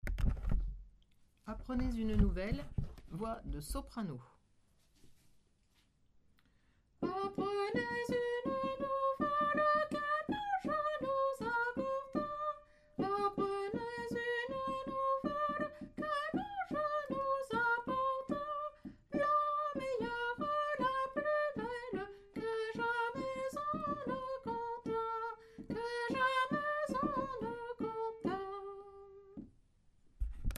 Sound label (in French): soprano; apprenez